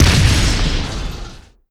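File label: explosion turret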